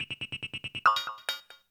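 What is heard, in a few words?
PERCUSSION ELECTRO 140, Techno Drum; Techno2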